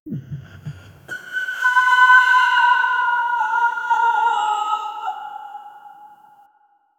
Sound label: a cinematic emotional cry, crying